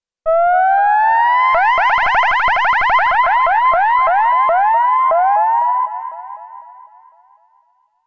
Disco Siren; KOPRO+

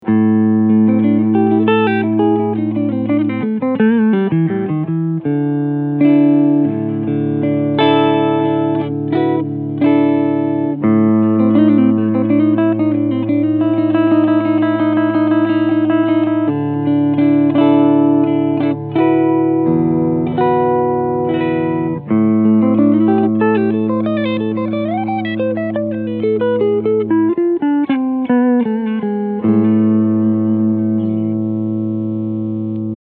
Harley Benton SC-450Plus Clean Front Pickup

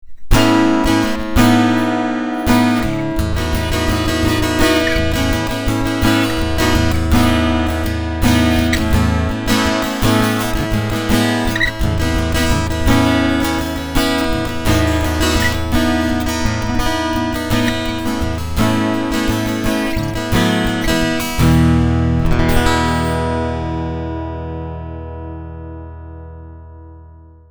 Strumming Boost Bass Treble

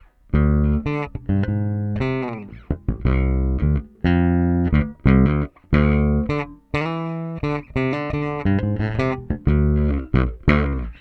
PJ Bridge

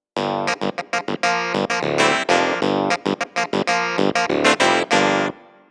Yamaha Clav

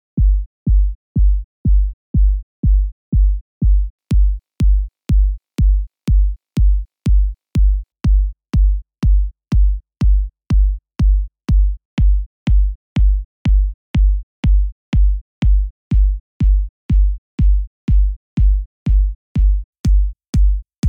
Techno Kick Kick Attack Samples

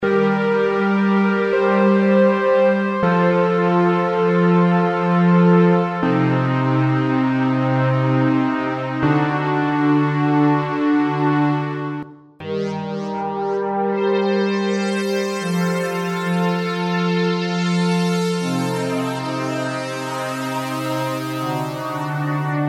Pads; HIP-HOP Producer

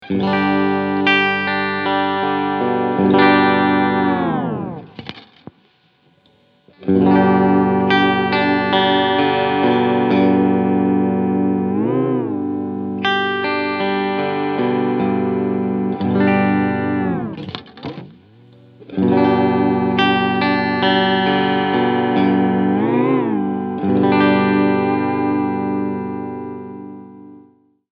Ibanez PWM20 Vibrato

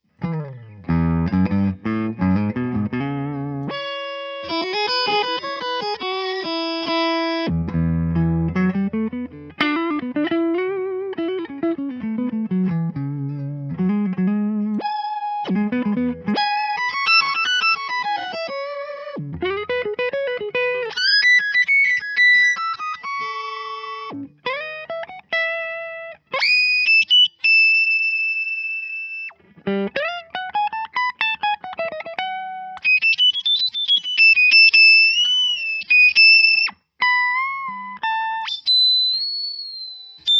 Solo 2 oct up fast

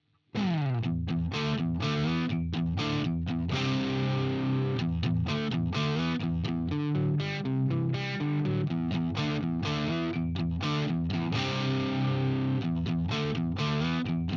HB Fusion-T dist both split

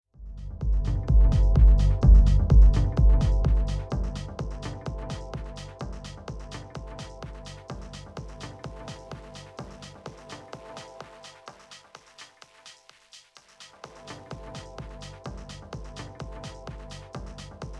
Pioneer DJS 1000 Lo Fi

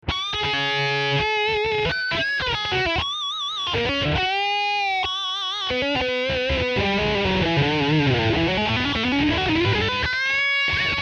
dirty dirty blues

